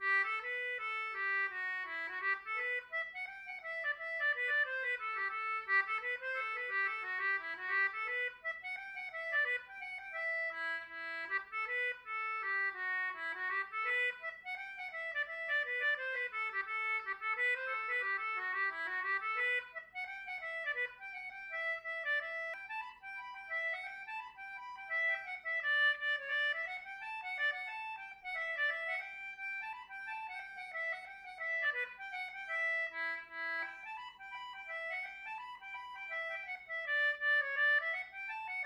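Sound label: Celtic Button Box, hornpipes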